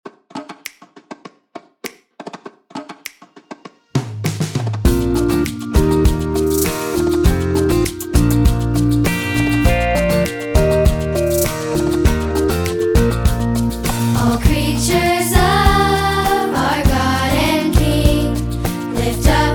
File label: band